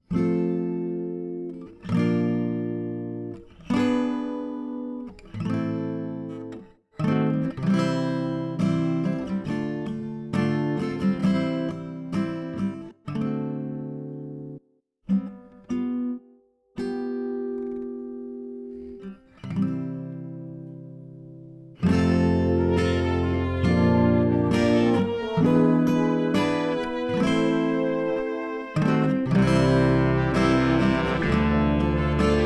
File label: Lonely Sunday Backing Track